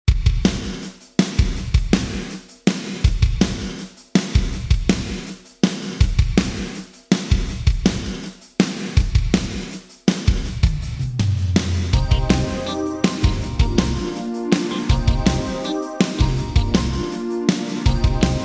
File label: Drums Sony MU R201 Slip Gate; Vintage Digital